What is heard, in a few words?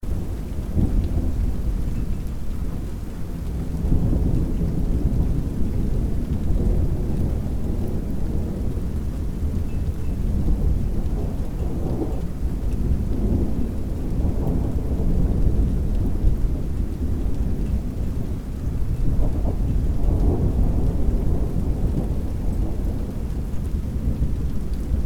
thunder sound